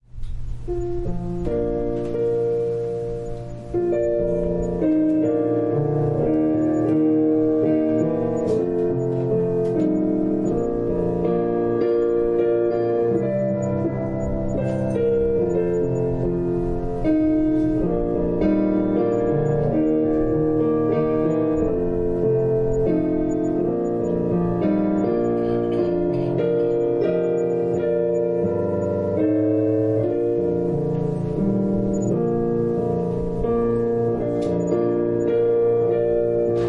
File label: Piano prelude